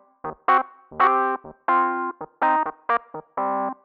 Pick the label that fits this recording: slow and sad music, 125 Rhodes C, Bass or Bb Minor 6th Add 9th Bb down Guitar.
125 Rhodes C